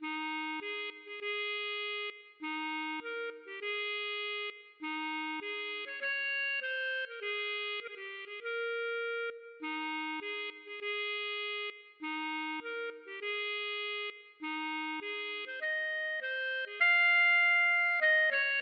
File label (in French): Marche Nuptiale; classique; Wagner